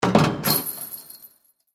Sports Basketball Chain Net Swish Shot Backboard Rattle